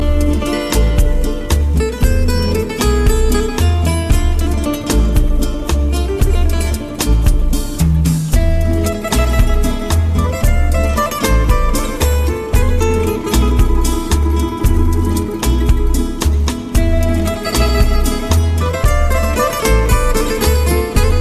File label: Guitar Samsung